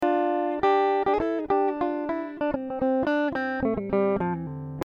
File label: Dm 100 GTR Riffs